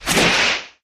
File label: skill effect